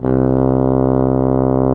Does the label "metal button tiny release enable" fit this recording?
No